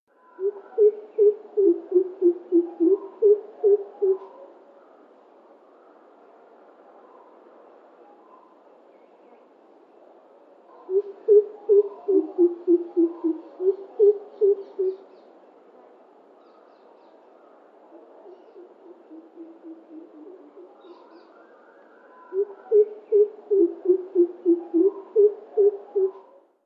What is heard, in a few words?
Greater Coucal a pair calling in different pitch